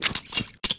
knife look, weapons; Counter Strike Model Pack; CS2; bknife

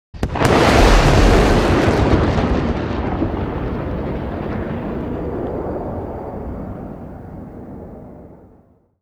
jet sonic boom; animation jets; Counter Strike Model Pack; CS:GO

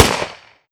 combine smg body; weapons; Half Life Alyx

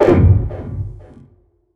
strider vox ping; creatures

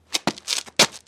foot scuff concrete

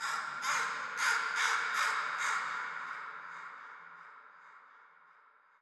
crow, ambient, nature, birds; Portal 2

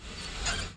bank doors move

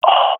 death, swat fem; vo; Counter Strike Model Pack; CS:GO

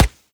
sand, bullet impacts; Half Life Model Pack

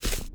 grass, footsteps; player

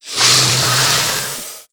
threaten, headcrab black; creatures; vox

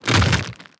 step movement, zombie blind, foley; creatures

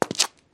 footstep concrete

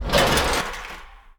release, ladder

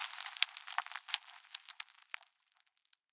rockfall; ambient; dust2

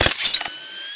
blades out, roller mine; Half Life 2

